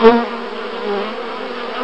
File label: BUZZ BEE; insects